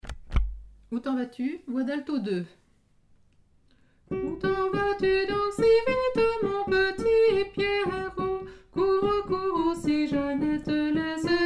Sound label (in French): alto; ou ten vas tu